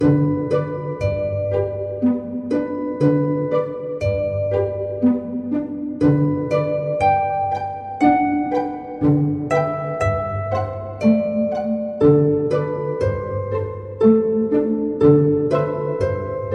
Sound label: Brahms Lullaby Song